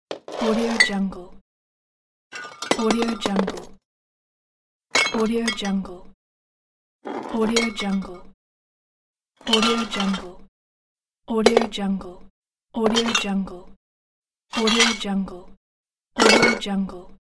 bar counter; teasound

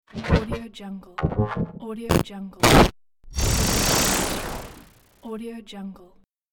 the glitch

